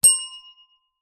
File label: bell small reception desk bell single ring